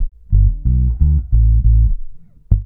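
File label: reggae bass #F; Best Service Advanced Media Trax Modern Composer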